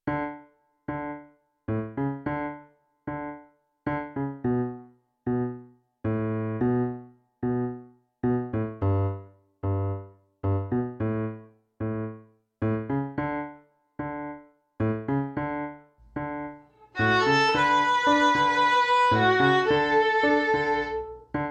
Beginner Violin Piano 110 BPM

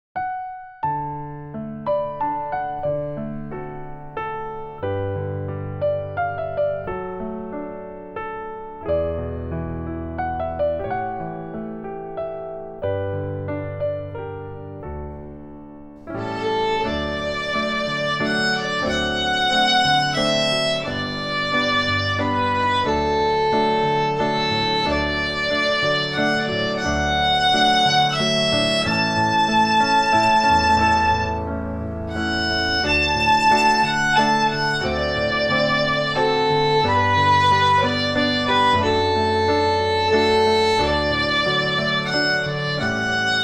Beginner Violin Piano 90BPM

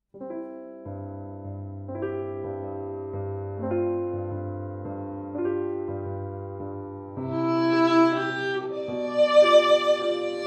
Beginner Violin Accompaniment 105 BPM

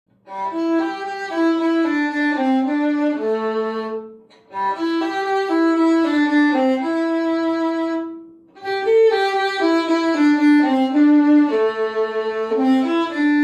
Beginner Violin Solo 115 BPM

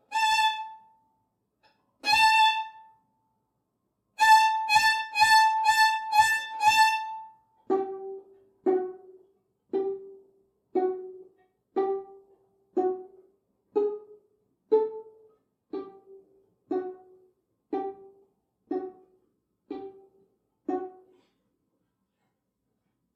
Beginner Violin Solo 120 BPM

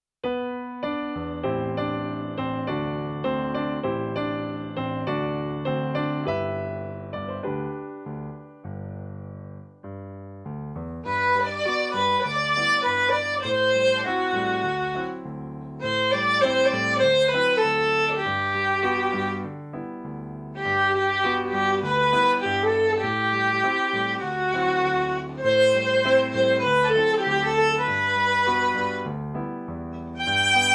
Beginner Violin Piano 100BPM

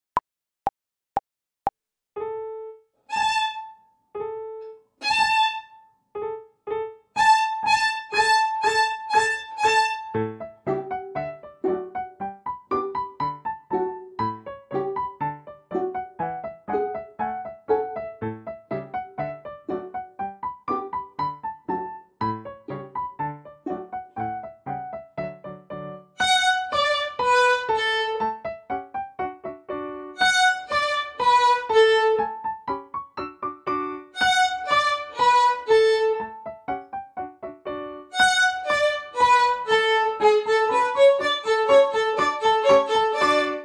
Beginner Violin Piano 120 BPM

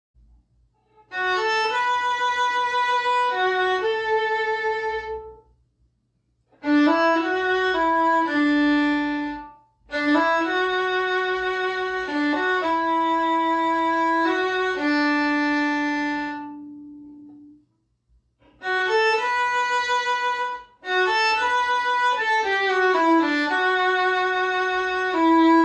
Beginner Violin Solo 110 BPM

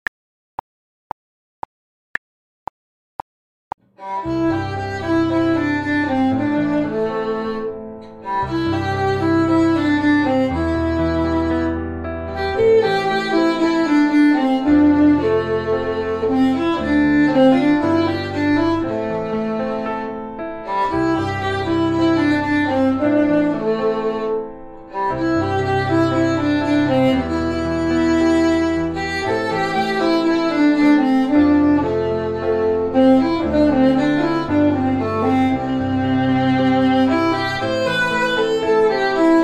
Beginner Violin Piano 115 BPM

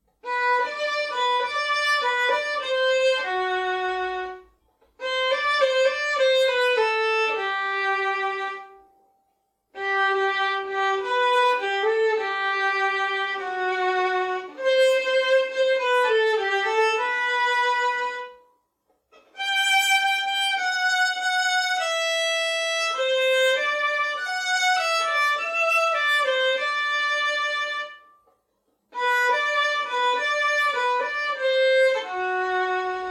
Beginner Violin Solo 100BPM

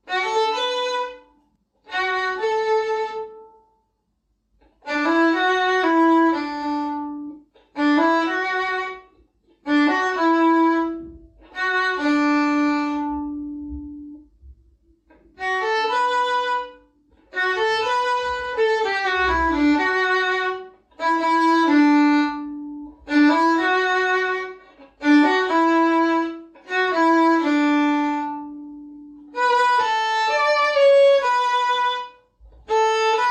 Intermediate Violin Solo 125 BPM

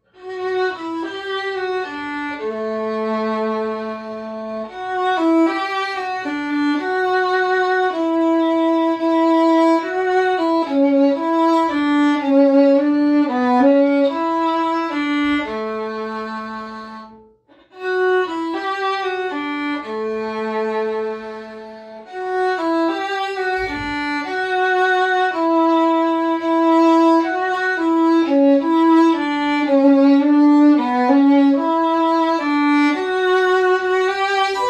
Beginner Violin Solo 110 BPM

